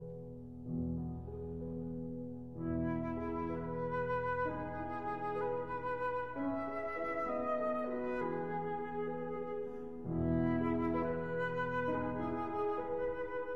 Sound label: Berceuse (Lullaby) for flute and piano